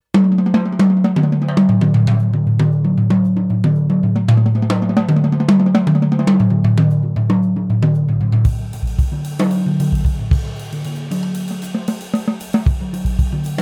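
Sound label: Gretsch RN1 Renown high tuning, drum kit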